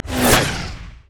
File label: charged shot; LoL; graves